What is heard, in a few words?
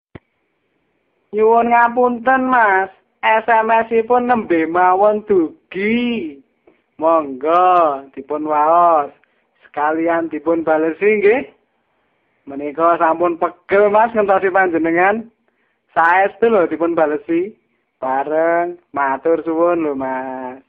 funny sms; SMS Lucu